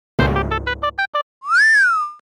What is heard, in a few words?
Death; Bounce Tales; mobile